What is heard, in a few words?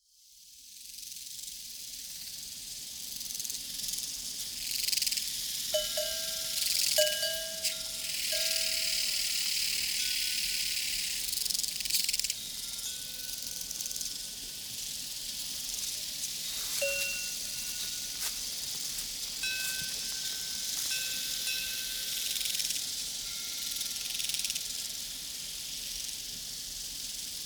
Nature Sounds Sounds from a Prairie Crickets and Cowbells; Nature Chill Out 2014